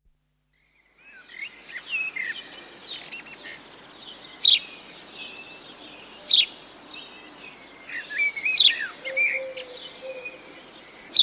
Summer afternoon farmyard atmosphere with house sparrow and blackbird calling, mid distance, with collared dove; Outdoor Sound Effects; Farms; BBC